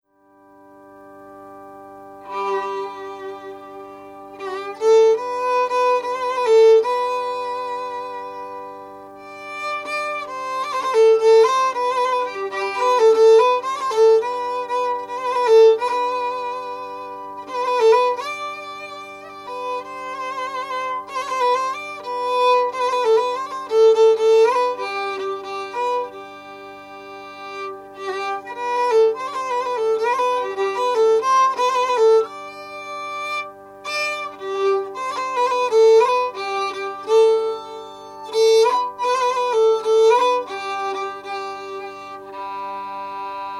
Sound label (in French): maj court impro